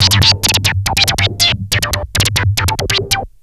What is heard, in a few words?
Treat Guit, Industrial Nasty